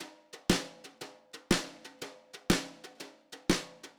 Drums, Jazz

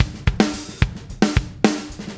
Drums, Rock

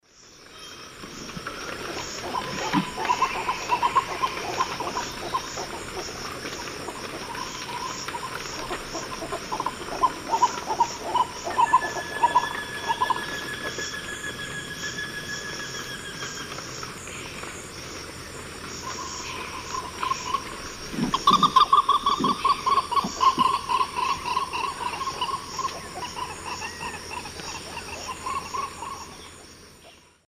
Mesembrinibis cayennensis, flight calls; S. America; Eastern Lowlands

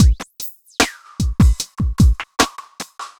evil beats

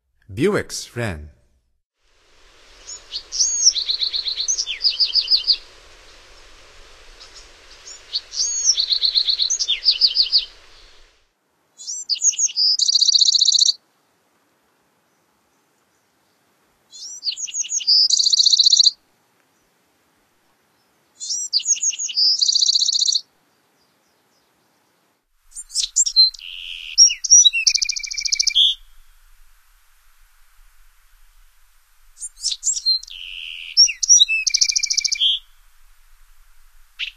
Bewicks Wren, Songs of Birds of North America; Stokes

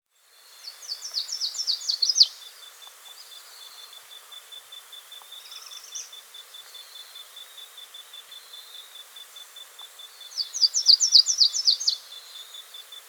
Slate-Throated Redstart, Myioborus, S. America

